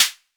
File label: snare